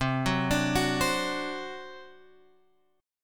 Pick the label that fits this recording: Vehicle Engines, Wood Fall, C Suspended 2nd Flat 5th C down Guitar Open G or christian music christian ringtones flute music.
C Suspended 2nd Flat 5th C down Guitar Open G